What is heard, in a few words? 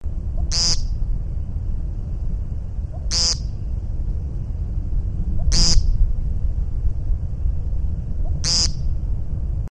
American Woodcock